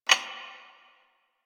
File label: Mug Clink; Warframe; Quests; TheNewWar; Ambience; Zariman